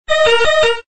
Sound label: Special alert